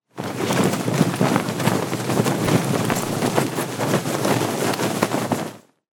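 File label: sound of open parachute canopy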